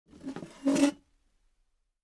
Chair moving

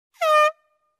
Air Horn

